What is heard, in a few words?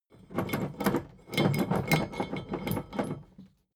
drawbridge winch hoist sluggish; soundpool